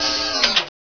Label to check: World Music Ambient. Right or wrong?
Wrong